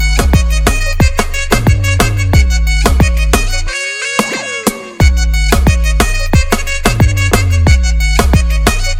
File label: Ringtone